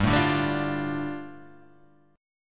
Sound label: G 7th Suspended 2nd, Guitar Half Step Down